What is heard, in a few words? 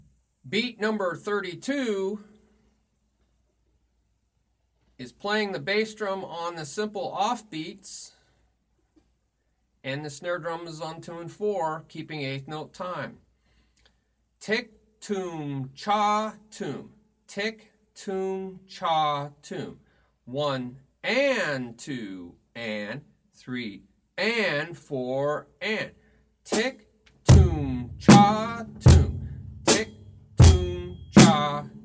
Beat 1 2 3 4 Ti Toom Cha Toom Ti Toom Cha Toom